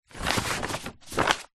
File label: paper sheet a3 turn over; zapsplat